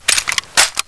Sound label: forearm, weapons; svencoop; paranoia